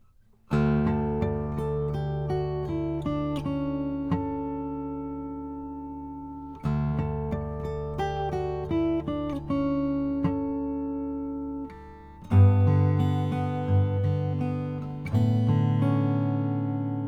strahm eros mun ebony

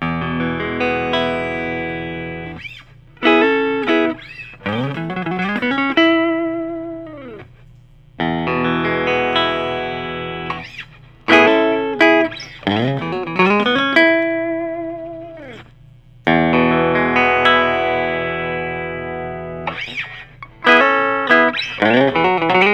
2013 gibson memphis es 330 vintage natu

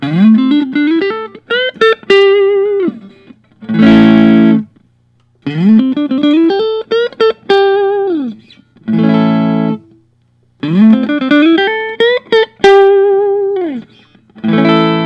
james tyler studio elite hd p; rasta

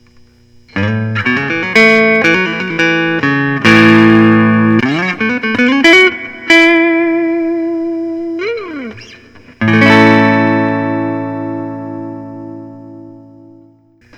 gibson les paul special dc cherry red

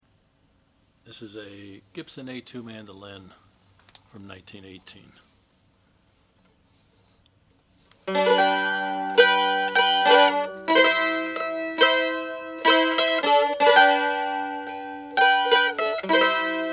gibson a2 brown gibson a2 mando, Mandolin; 1918

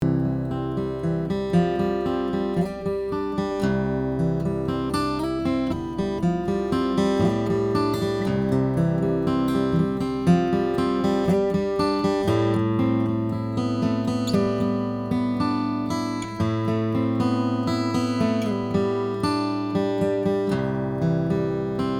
webber om quilted maple

